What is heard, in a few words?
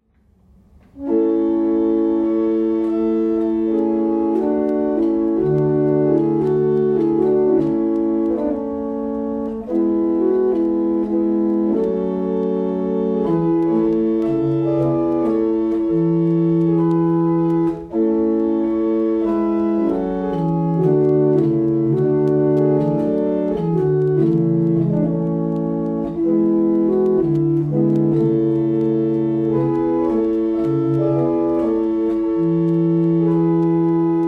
barrel organ; Lillington